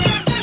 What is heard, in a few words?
spray full; jet set radio